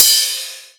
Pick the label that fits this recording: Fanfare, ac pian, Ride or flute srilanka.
Ride